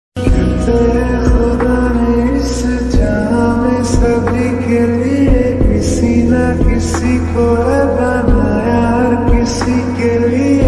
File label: lofi hindi ringtone